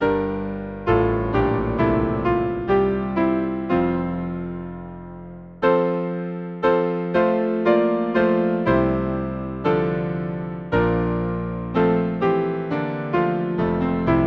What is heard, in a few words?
Hymn, piano; Lo how a Rose ever blooming, Es ist ein Ros